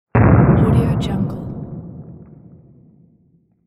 explosion

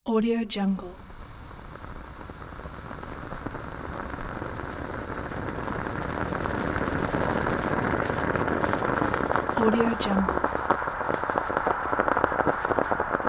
coffee making

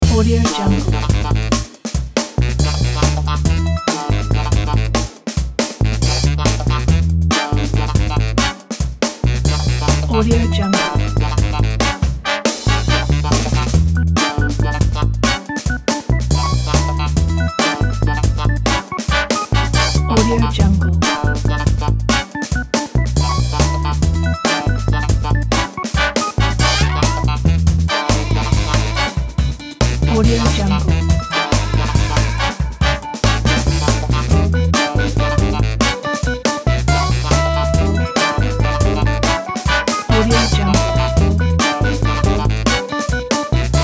funky

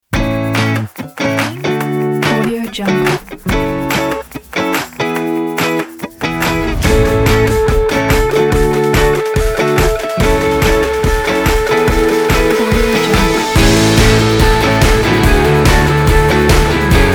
inspiring indie rock

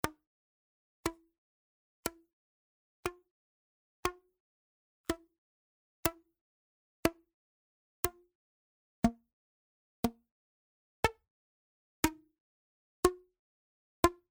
small rubber band pluck

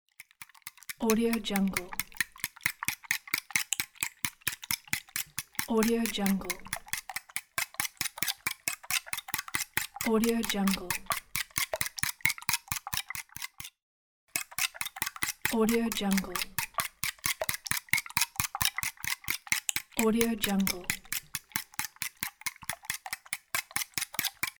mixing eggs

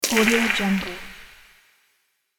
asylum horror stone rubble debris

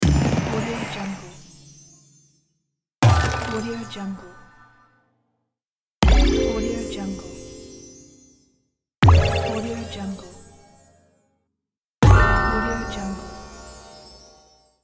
magic explosions

